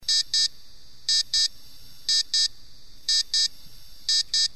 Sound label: alarm